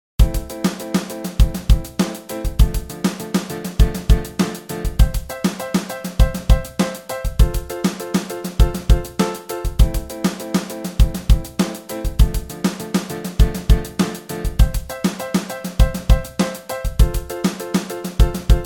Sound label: chord sequence